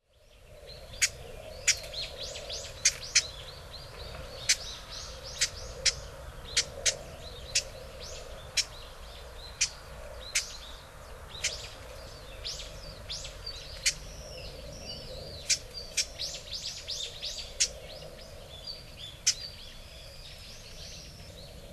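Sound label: Japanese bush warbler, hyogo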